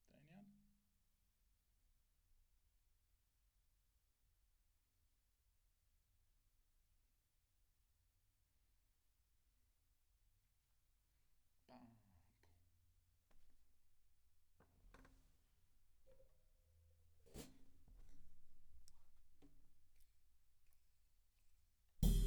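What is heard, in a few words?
Snare Top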